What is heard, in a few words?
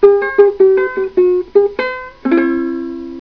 vamp, ukulele